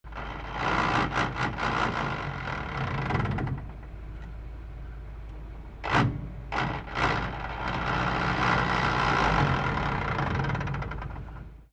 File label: Gear Grinds